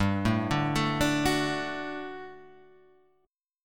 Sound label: G Major 7th Suspended 2nd G